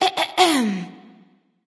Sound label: clear throat; smite; voicepack; khepri